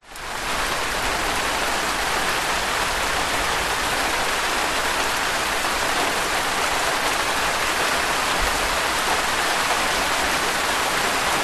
nature rain medium houses plants loses slight intensity over time